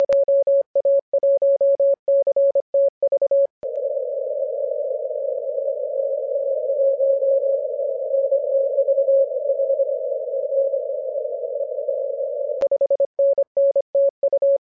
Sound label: 10mhz